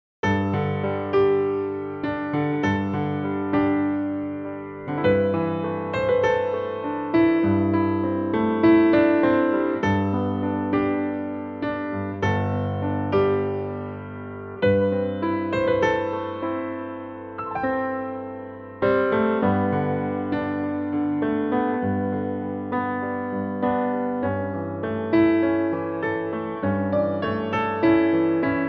piano G; range - G to C; Piano only arrangement

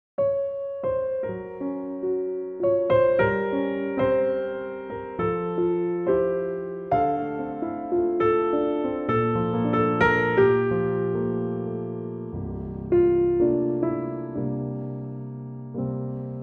F piano

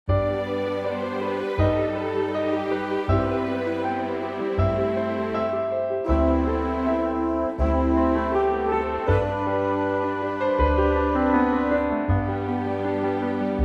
G orchestral

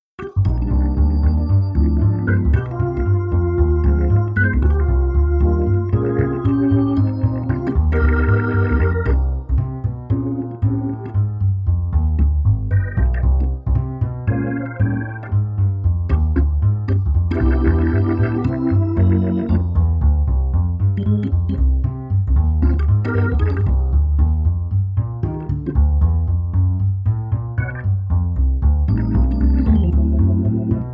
organ; Hammond duo; key - Cm & C - vocal range - C to E; Super swinging, gritty, groovy and dirty Hammond organ plus upright bass arrangement

